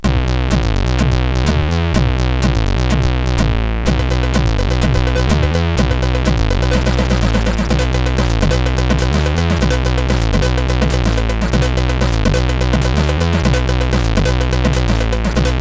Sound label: Beat Diz, CSG8580R5; hvsc